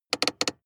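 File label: sports car interior handbrake pull medium; verkehr-transport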